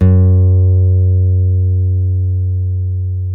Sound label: STEEL, Guitars